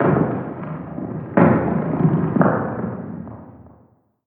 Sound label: Random Rock